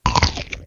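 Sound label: short recording of eating sausage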